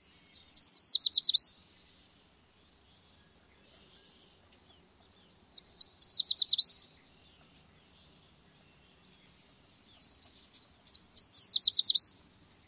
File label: songs